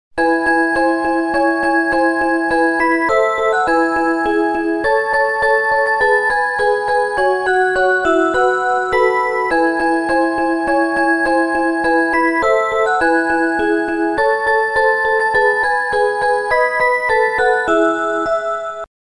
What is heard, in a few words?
Jingle Bells